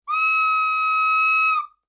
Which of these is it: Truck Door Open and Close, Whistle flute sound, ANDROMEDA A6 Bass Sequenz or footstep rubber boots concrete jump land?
Whistle flute sound